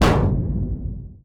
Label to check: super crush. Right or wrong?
Wrong